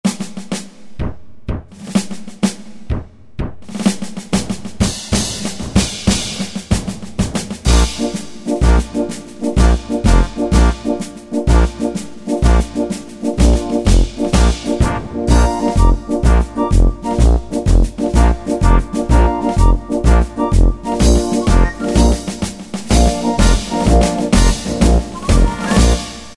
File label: March, Yamaha QY70 Preset Styles